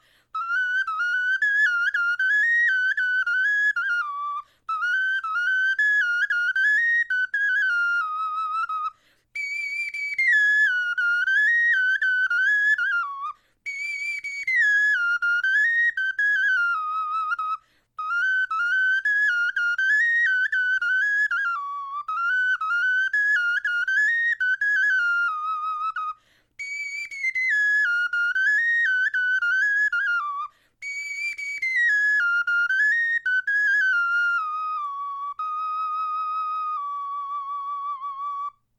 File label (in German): melodie, knochenfloeten